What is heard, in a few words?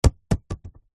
sport soccer ball bounce to stop concrete